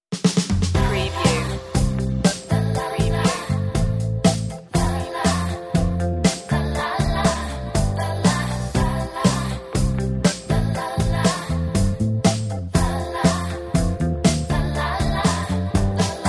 joyful pop stinger

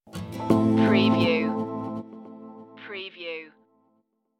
road to reflection stinger

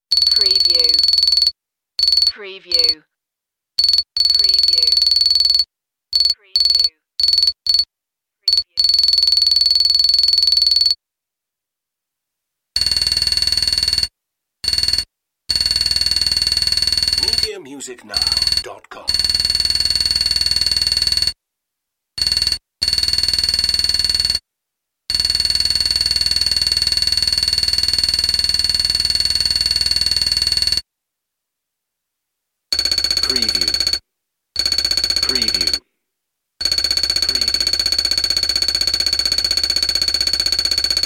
data transmission beeps

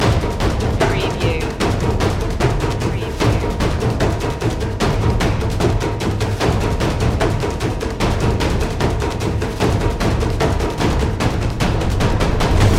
taikos loop

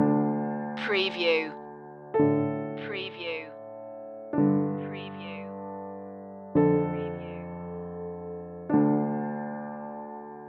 solo piano

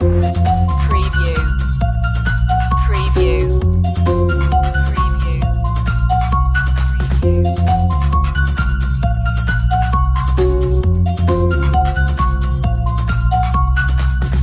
energetic playground loop

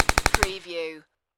Machine Gun Thompson Machine Gun Short Burst

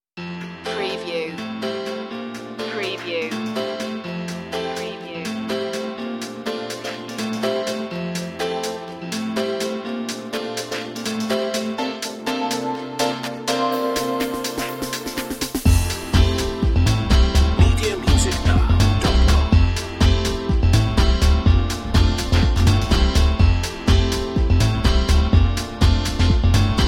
vintage beats

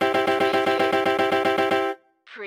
Casino Electronic Sound Payout

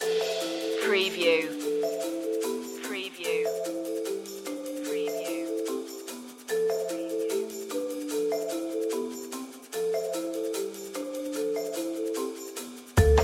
flying home loop

